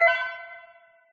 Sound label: point; Minecraft